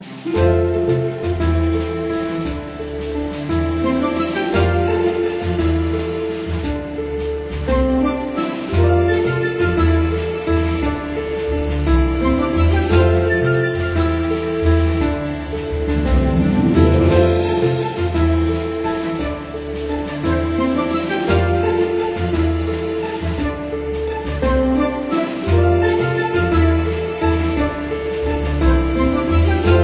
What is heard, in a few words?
Happy connect Music